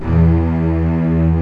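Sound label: arcato string; OMI - Universe of Sounds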